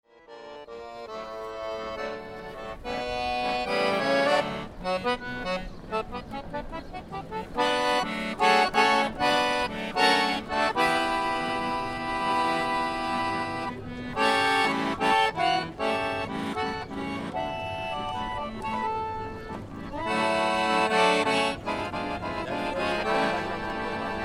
Street Accordeon: Close up accordion performer in street of European cit; Street Accordeon 1; Concert Sound Effects